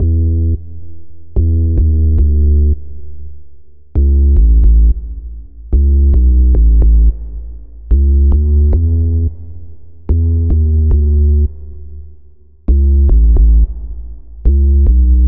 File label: chorus bass